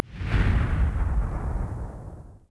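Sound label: ambient explosion; urbanchaos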